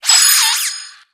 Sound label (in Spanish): Grito de Snom